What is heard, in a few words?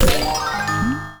Musical Pop; Pocket Planes; android